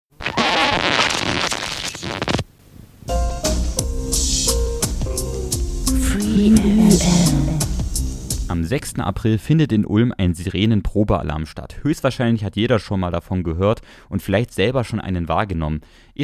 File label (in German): Sirenenprobealarm